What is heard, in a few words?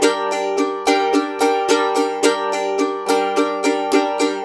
Blues Guitar, RIFF; ILIO; Fretworks